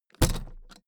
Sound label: suitcase put down hard